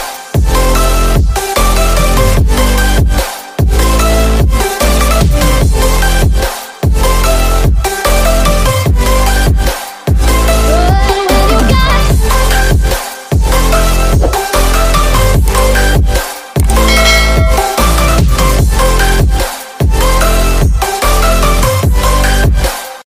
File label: top cartoon ringtone